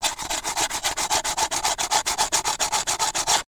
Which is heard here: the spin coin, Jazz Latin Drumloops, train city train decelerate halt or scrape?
scrape